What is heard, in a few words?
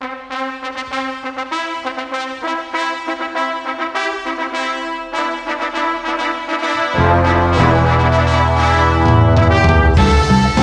Fanfarer; Rocky; Nintendo GameCube